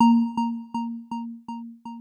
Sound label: Outgoing Call; qTox